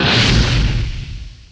Petrol; worms2

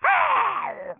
damage; Dark Eden Beta Test

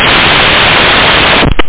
Bass; Scene 96 International Edition; Zyklop Software

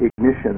ignition

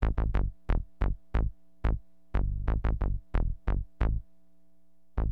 BASS, HipHop; LiveAct Pool

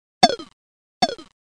bounce

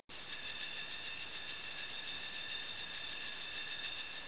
BELLS; Image Bonanza; Expert Software

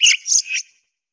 bird budgie; Earth and Beyond; EA Games

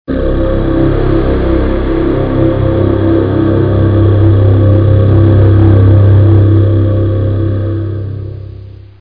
drone

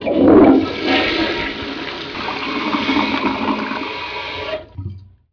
toilet; BESTSHOT; noisybit

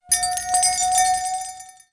Sound magic

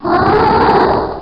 respawn dangerous; axisdemo

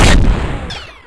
shoot; PC Gamer IT CD 48; Big bang

